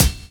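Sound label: drum chop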